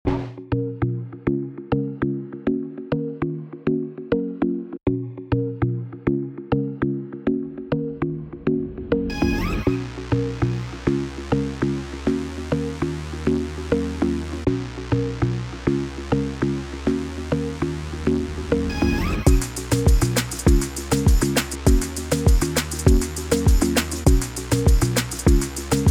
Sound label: reggae mystique